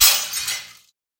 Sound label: Glass Break; SoundsCrate